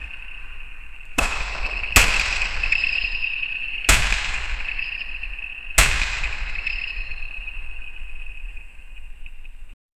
wood

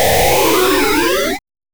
player die retro pixel art

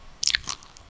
brittle gasp hiss with

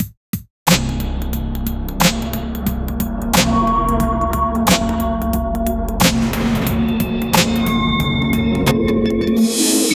horror beats; sprunki

